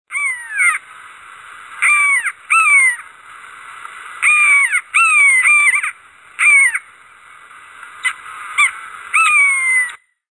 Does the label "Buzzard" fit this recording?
Yes